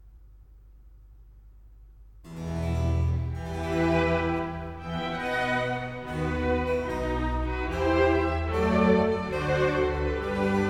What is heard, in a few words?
Concerto Grosso in E major, Larghetto; Handel Masterworks; Klassika